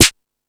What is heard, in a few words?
Discoball, E-MU Pro-Cussion